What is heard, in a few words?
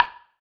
perc organic thick